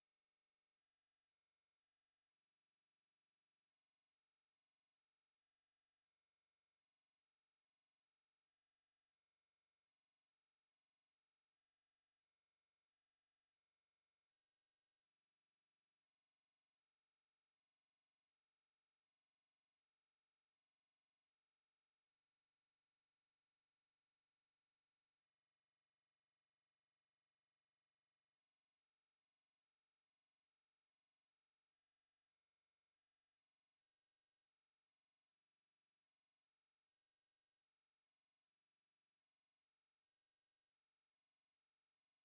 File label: bgm only